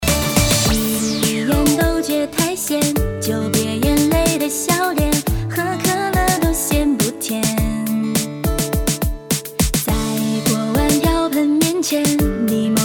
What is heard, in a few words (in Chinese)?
歌曲